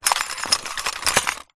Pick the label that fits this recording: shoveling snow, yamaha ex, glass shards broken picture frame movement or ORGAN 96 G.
glass shards broken picture frame movement